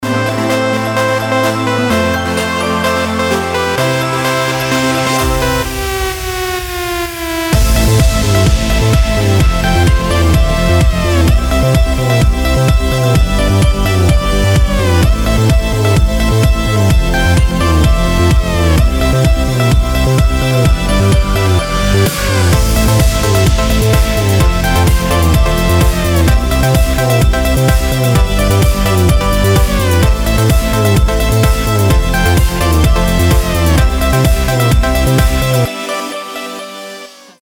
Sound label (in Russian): klubnyj jingle bells